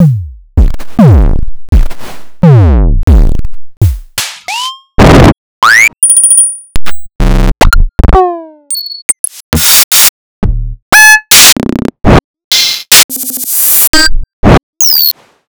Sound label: Star-Instruments Synare PS-1